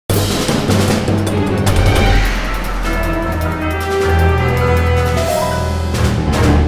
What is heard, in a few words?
Fight; music; Silas; The Da Vinci Code; XBOX